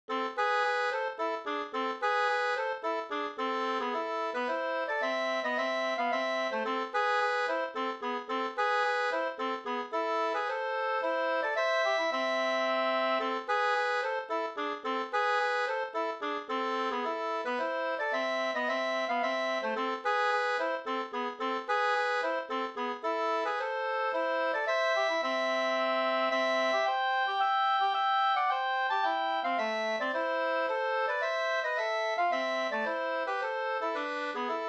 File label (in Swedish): slängpolska